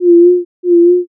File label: owl